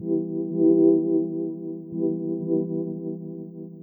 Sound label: 125BPM Pad, techno; drum fx; Beats